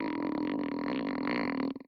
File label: Pinch; LOOPERS